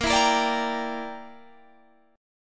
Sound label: A sharp 6th Flat 5th, Guitar Standard; A#6b5 strummed; JGuitar